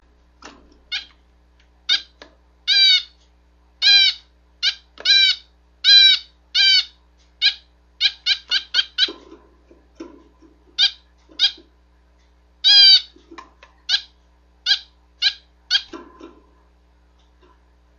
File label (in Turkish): baykuş dişi